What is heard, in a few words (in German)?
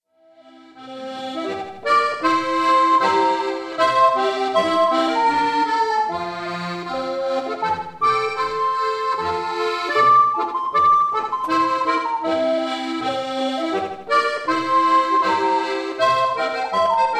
boarischer ausschnitt